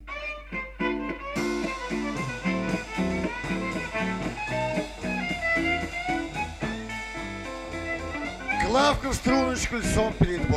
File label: severny klavka